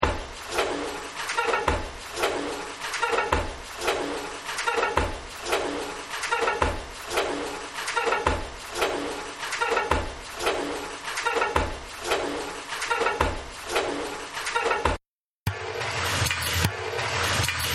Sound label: Factory; Factory 19